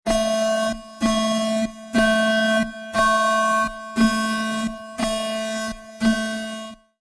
Radioactive Alarm

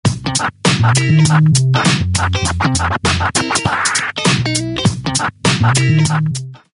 swingin funk scratch

